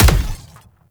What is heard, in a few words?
Infested Spore Pistol Punch; Weapons